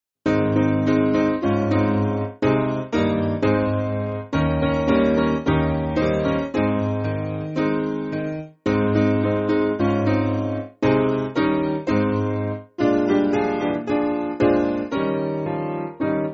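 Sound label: Piano, Music Backing Files for Churches; New Name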